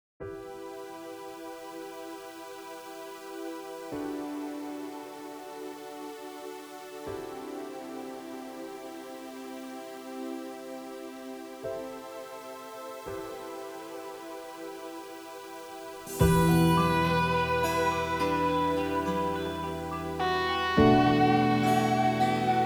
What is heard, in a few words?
Karaoke, 105 BPM; BasserMusic Studios